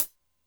Cym Tension, Drum Machines Alesis D4

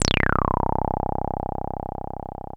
303 C1, TB 303; Hollywood Edge; Giorgio Moroder Rare Synthesizer Collection

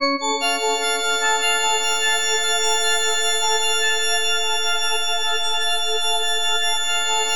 FM C# AUG; Bolder Sounds

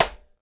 SIDE STICK, STICKS

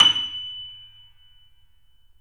Yamaha C7, 3D Pianos; E-MU Producer Series Vol. 5

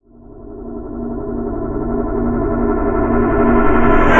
Reverse Fx; musicradar

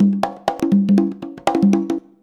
CONGA 119, Modern Percussion Loops

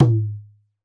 Dholak Ga; Roland BK-5; Percussion

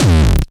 Yamaha CS6, Drums

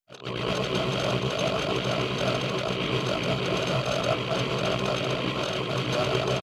toyota granulized; random and out of place

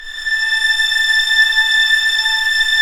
STR UNI P, Vls p Orch; String Master Series; Vlns 7 Orch

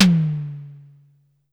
Drum X Tom, Pearl

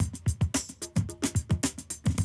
110, Classic Drum Loops

